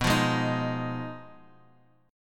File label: B 6th Flat 5th B down, chord